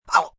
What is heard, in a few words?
Grunt Short Ow, Grunts, groans, impact, female; Groaning Sound Effects